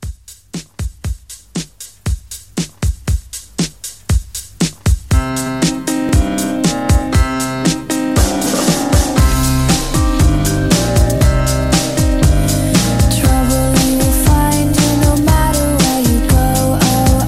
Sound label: Mixshow Re Drum 118 CLEAN